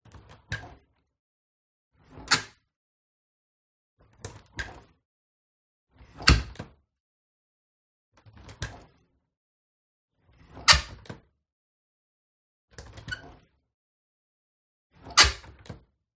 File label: WINDOW Kitchen Plastic Handle Open and Close Soft Close Perspective; gain walkers